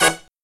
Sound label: HIGH HIT